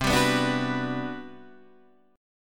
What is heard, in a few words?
G Major 7th, C, Gmaj7/C chord; strummed; JGuitar